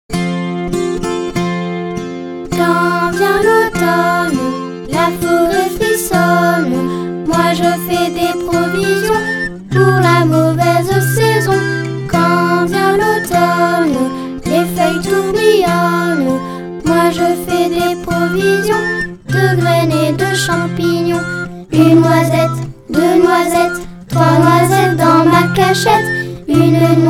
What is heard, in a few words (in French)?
Chanson Quand vient l'automne